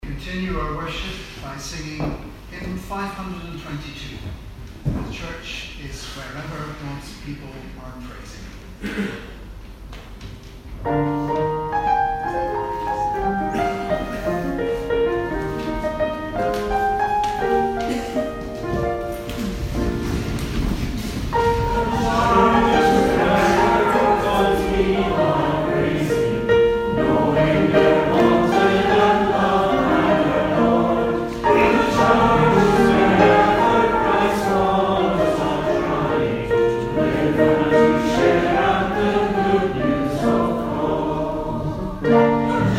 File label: hymn